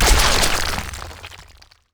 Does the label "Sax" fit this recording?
No